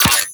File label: Pistol Projectile Attach; Guandao